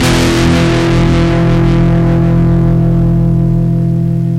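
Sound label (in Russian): гитара с дисторшном одиночный аккорд яркий шумный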